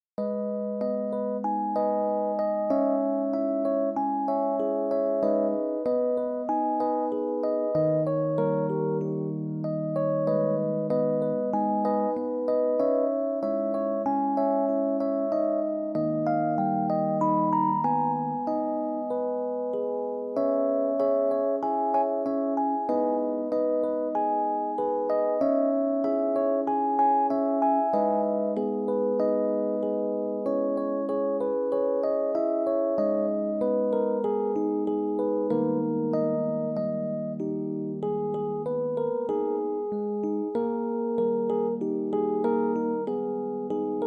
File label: bgm musicbox